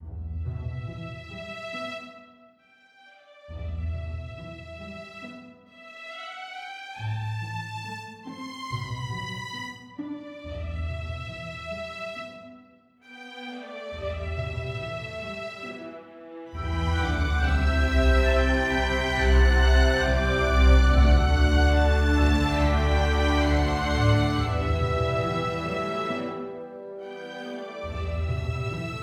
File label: Orchestral emotional strings piece